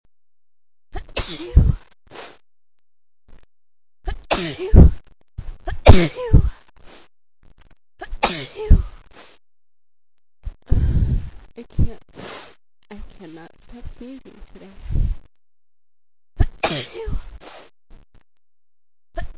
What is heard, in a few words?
ugh fit, female